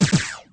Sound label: Shell; Shells; MicromanÃa 35